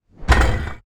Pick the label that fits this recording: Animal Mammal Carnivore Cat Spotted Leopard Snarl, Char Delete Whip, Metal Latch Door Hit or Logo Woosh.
Metal Latch Door Hit